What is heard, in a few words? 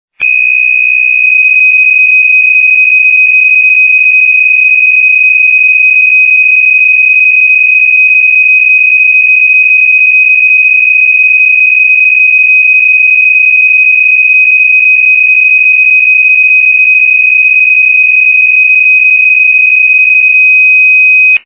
PSK63 test message